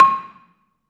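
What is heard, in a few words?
Balafon 2 C5, Asian Traditions; Soundscan vol.60; AKAI; BELAFON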